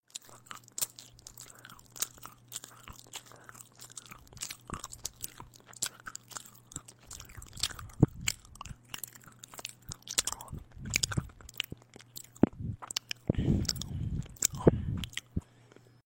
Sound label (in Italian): suono masticare